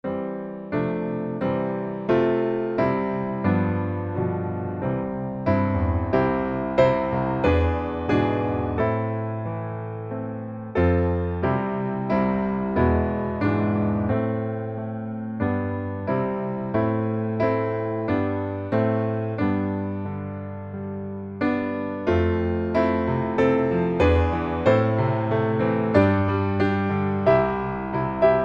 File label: piano